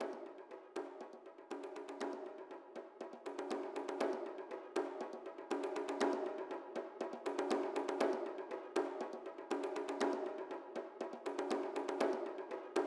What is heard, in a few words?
Rythmic; Sifu; Corridor; H3 Corridor Phase=Phase1